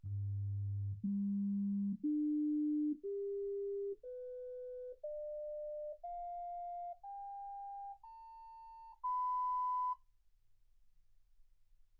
sinus tones 48kHz; single mic

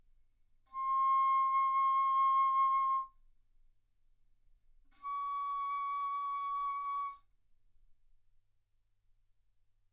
Bass Flute ff C6 Db6

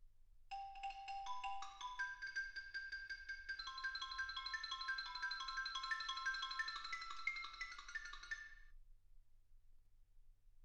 xylophone

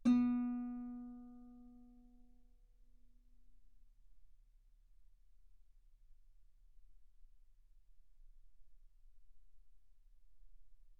Guitar ff B3; sul B

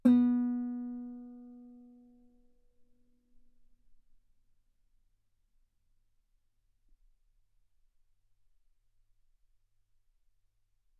Guitar ff B3; sul B

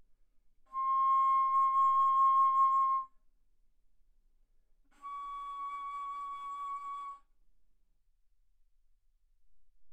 Bass Flute ff C6 Db6

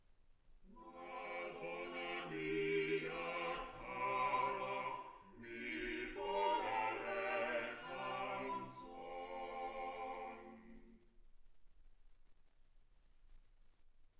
quartet

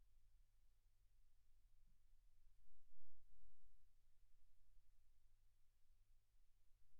silence 48kHz

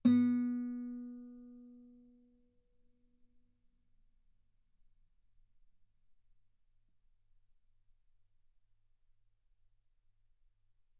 Guitar ff sul B B3

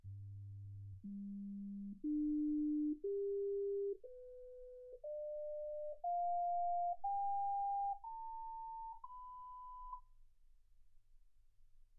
sinus tones 48kHz

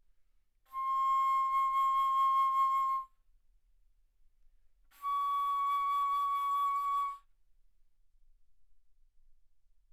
Bass Flute C6 Db6; ff

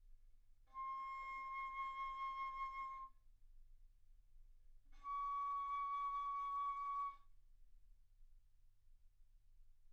Bass Flute ff C6 Db6

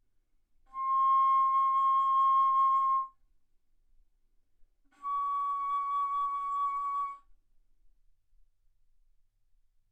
Bass Flute C6 Db6

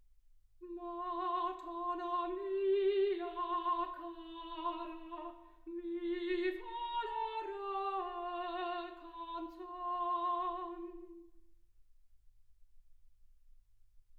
soprano

